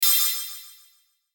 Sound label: Pixie Dust Tiny Shimmer Hit; Magic; Blastwave